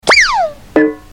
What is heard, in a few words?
whizz